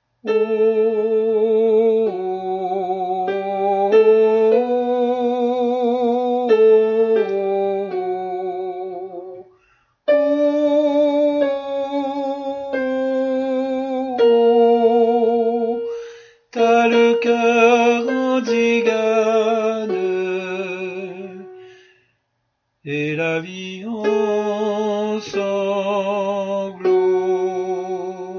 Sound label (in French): tenor